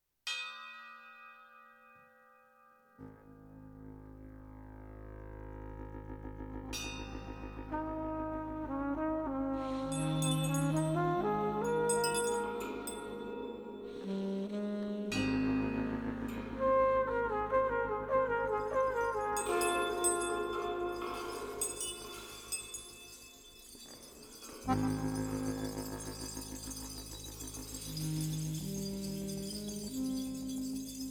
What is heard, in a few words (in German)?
A Jodel Landler